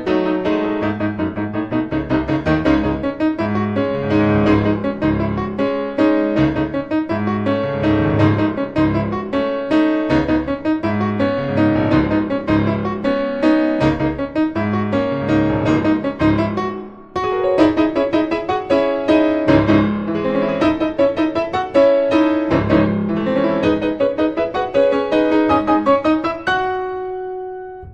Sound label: Piano